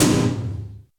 Roland U110 Rock Drums D2

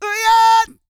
SING, World Voices, CARIBBEAN

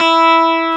E-Guitar, STRATO

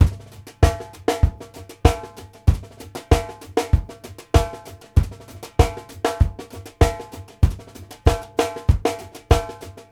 FOUR ON TH R, New York City Drumworks, BR+STK 96; Sampleheads; New York City Drumworks VOL-1

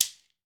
GHANA, African Perc

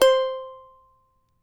charango C4, guitars; Spectrasonics; Hans Zimmer Guitars VOL-2

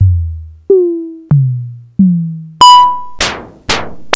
Kawai SX240

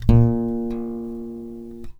DRY BASS, Electric Basses; PlatinumPhattCD2; E-MU Producer Series Vol. 8 – Platinum Phatt

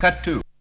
VOX; Zero G; Man Machine

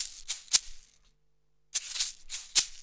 Roots Reggae; Sounds Good

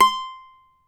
CAVAQUINHO C, Guitars; Spectrasonics; Hans Zimmer Guitars VOL-2

